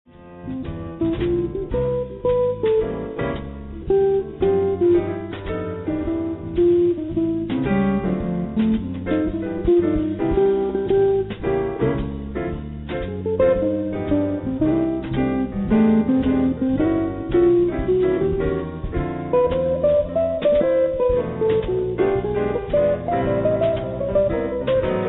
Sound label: Solo; Romantic